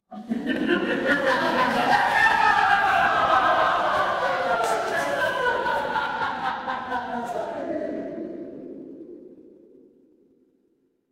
crowd laughter

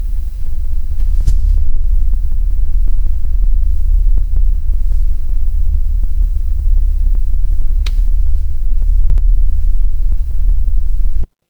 ambience room tone

